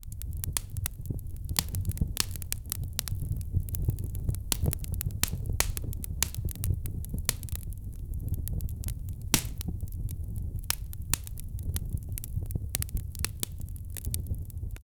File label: sound of a fireplace with